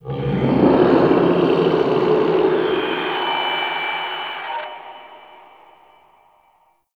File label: Distant Infested Low E; Warframe; Ambience; NineteenNinetyNine